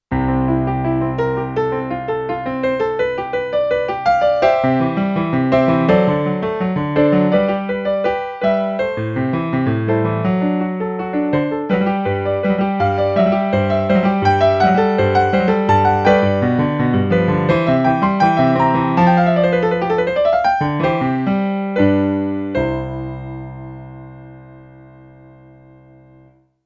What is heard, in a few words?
bach prelude in c major; bwv 939